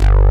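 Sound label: ANALOG BASS; Pro-Rec - Synthsonic; BASSES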